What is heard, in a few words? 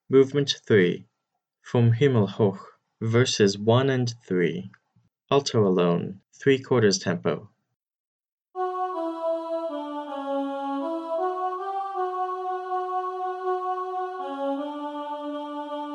alto alone three quarters tempo, choir